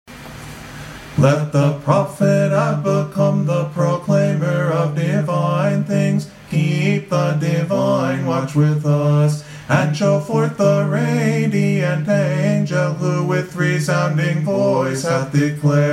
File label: paschal canon ode